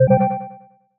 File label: Android 4.4 Argon notification